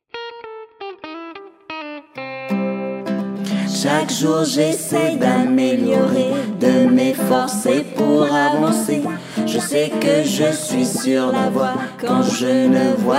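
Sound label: Song, French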